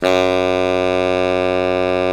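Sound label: tenor sax G#1; TSX TENR SAX; Giga Samples Collection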